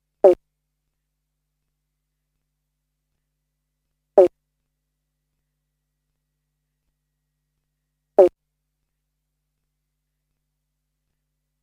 Limnodynastes dumerillii (Eastern Banjo frog or Pobblebon